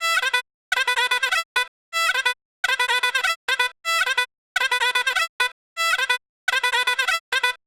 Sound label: Baraat Shenai Loop BPM 125 E; Gully Loops; baraat shenai essentials